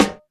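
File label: OLD SCHOOL, SNARES; Back In Time Records; Big Bang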